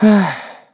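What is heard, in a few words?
Vox Sigh, Korean; Evan; kingsraid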